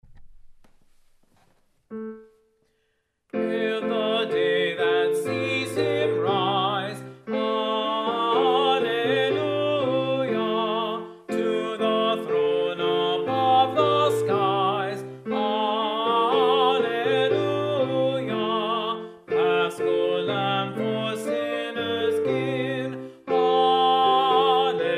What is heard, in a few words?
Tenor; Hail the Day that Sees Him Rise; Choral Resources